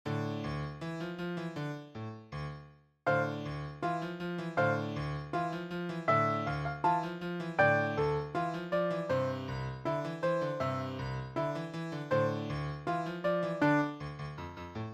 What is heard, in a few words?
Duet Rhythm Band